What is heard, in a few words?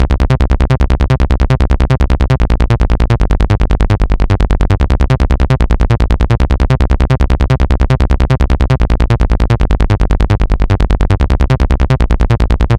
Battle Bass; acid techno trance vol2